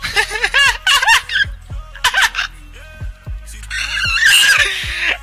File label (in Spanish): risas